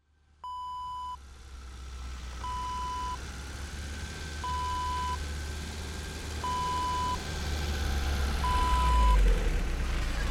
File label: car drive up shut off